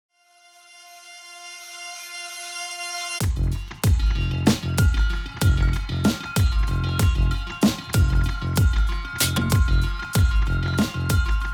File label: 190bpm